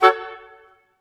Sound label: F#-A-C#-F#, Woodwinds, Chords; PowerFX; Downtown Orchestra